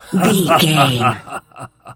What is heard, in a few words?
voice male laugh short